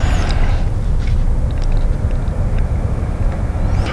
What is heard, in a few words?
cabin ambience; soundscape test